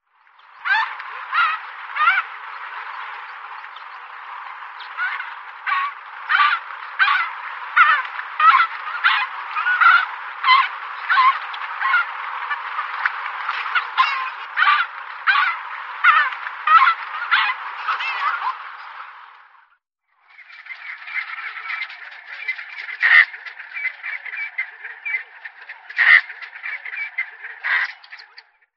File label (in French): chant